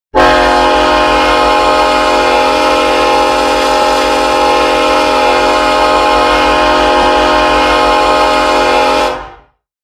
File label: k5lla, horn; run 8 v3 horn pack